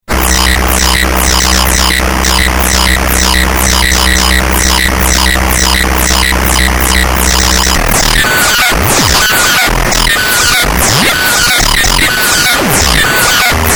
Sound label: mod loop